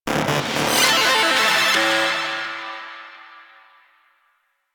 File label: Arcade Fail; Warframe; Nineteeen Ninety Nine; Ninety Nine Snake Game